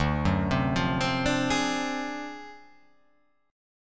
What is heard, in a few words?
D 7th Sharp 9th Flat 5th D down, chord